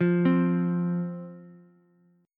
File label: F 5th F Ukulele; arpeggiated; chord; JGuitar